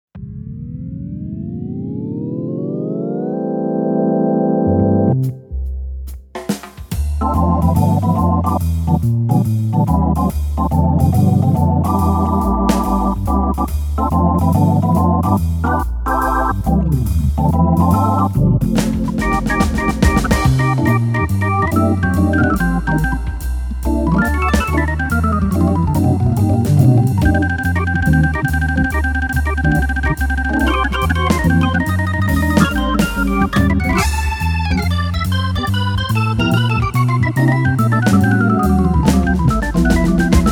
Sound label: Jazz Organ